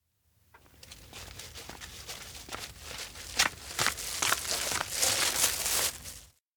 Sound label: steps outdoor bag rush; anemoi